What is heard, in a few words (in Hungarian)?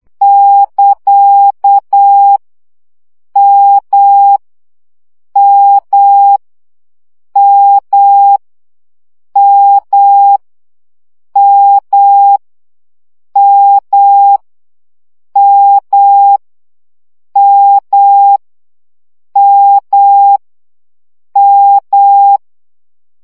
CW gyakorlas m 7 baud